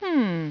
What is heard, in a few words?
hmm